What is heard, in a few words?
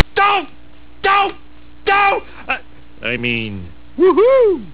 mean woo-hoo